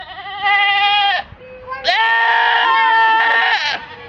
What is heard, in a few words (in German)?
ziege